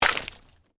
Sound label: Splatter Mud Debris, Texture, Splatter, Mud, Debris, Drop; Drops; Objects; BlastWave